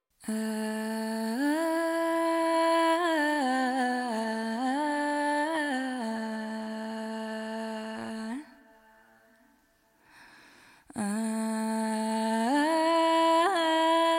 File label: Noise